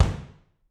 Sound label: BD, Drums; Bob Clearmountain Drums I